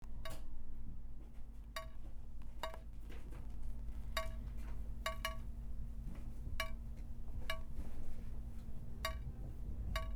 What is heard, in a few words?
leaky ceiling dripping